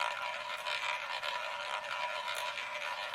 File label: roulette rolling